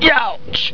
pain; quake2; postal

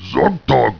fall; quake2; orc

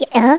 drown; quake2; snork

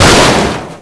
fall; quake2; tankemperor

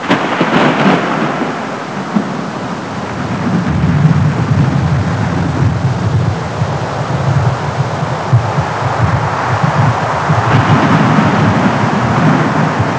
okami stormy; quake2; kitsune

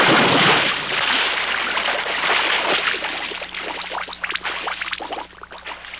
drown; quake2; necron99

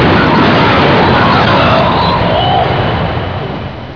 death; quake2; dalekx

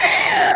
pain; quake2; slith

